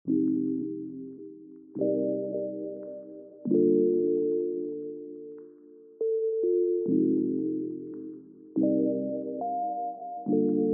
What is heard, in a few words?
chill music; brasil